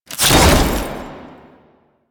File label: Ammo Cast Whoosh; DE; Warframe; Frumentarius